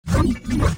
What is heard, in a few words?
teleport